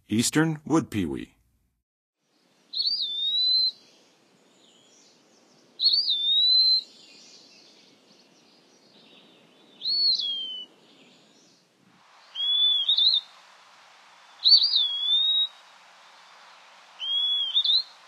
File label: Eastern Wood-pewee, Songs of Birds of North America; Western USA; Western USA Songbirds